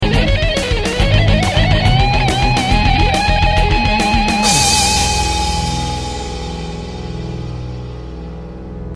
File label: sweep